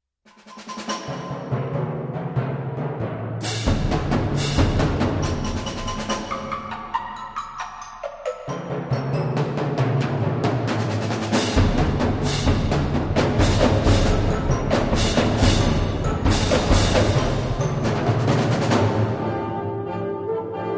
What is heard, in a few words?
Variations on a Bach Chorale, Cantus Firmus